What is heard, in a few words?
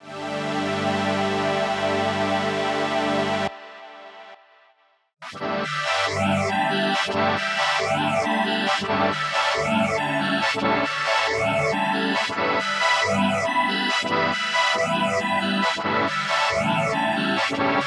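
spectral pad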